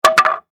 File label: Impact Wood Drop 2X4; Miscellaneous Impact